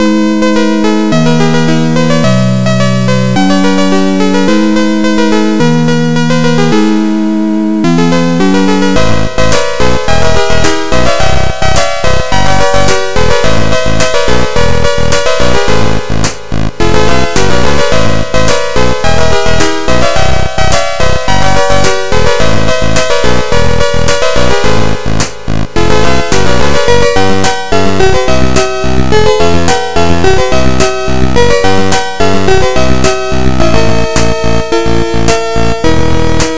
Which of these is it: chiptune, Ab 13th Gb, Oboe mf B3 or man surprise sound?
chiptune